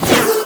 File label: Spin Whoosh; Dual Zoren Prime; Weapons